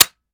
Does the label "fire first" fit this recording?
Yes